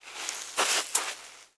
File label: grass